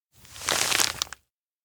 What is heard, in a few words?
handmade sweets bag pass; anemoi